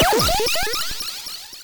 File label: impact